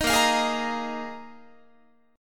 A sharp 7th Suspended 4th, Guitar; A#7sus4; strummed; 18,18,20,18,19,21

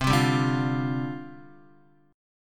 C Suspended 2nd Suspended 4th, down, Guitar

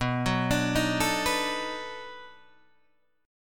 B 7th Sharp 9th, down, Guitar, Open D

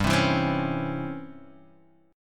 Db Minor Major 7th Flat 5th G

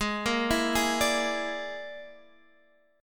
E 7th Flat 5th, Ab

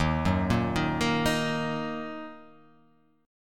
Eb Minor Eb; Ebm arpeggiated; JGuitar

